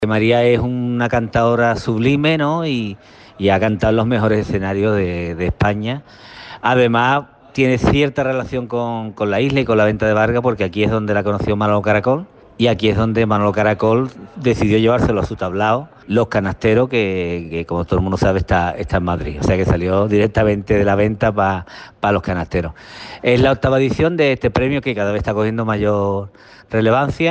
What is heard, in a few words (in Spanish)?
FLAMENCO